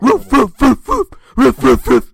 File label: dog